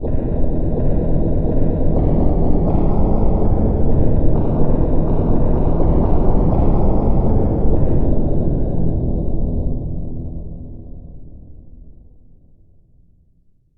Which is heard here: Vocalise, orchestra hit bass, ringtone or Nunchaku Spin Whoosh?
orchestra hit bass